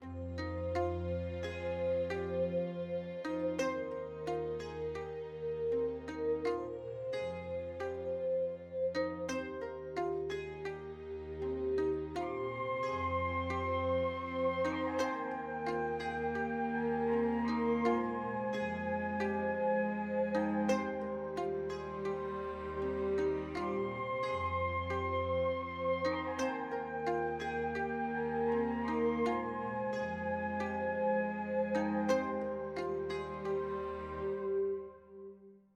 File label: Relaxing Ambient Music